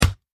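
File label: axe wood light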